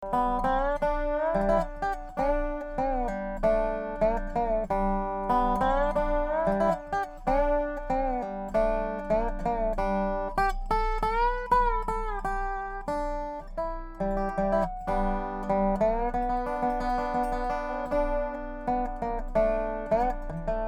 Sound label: gold tone